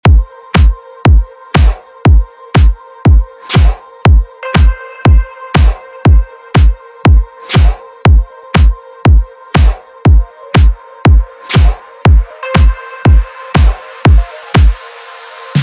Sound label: 80s House Vocal instrumental